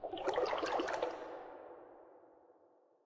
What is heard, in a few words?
water step; Minetest; backrooms